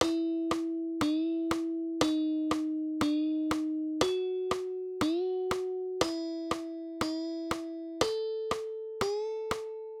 Bends

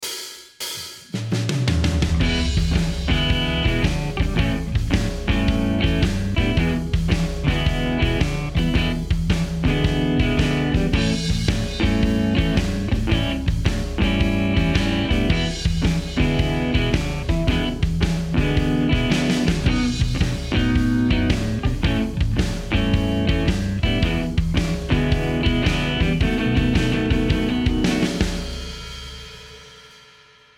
7th chords before changes

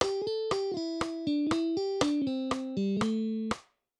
Slides example

